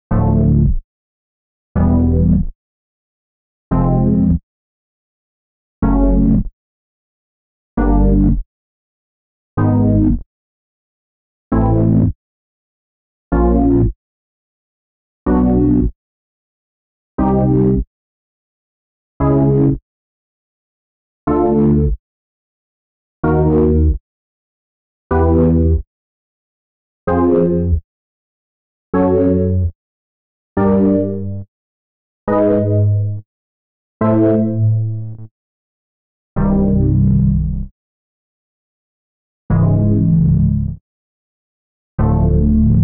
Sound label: Xpander chord bass